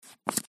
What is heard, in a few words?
card deal; new-years